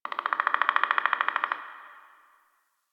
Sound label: Forest Bird Mid; Ambience; Warframe; Grineer Forest